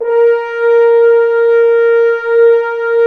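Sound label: french horns dry; Roland L-CDX-03 Disk 2; F.Horns 2 f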